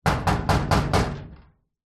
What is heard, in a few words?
impacts metal hollow cabinet old knock; x5